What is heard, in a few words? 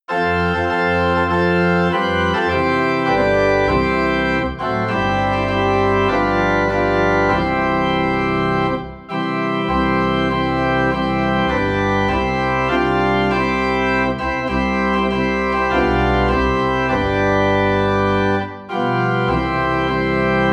Organ Low in C; A Shelter in the Time of Storm